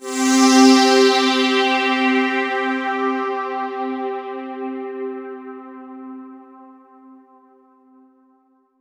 C3, Roland JV 2080; MG INTERVAL

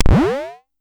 Pollard Syndrum

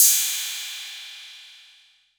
PERC 808

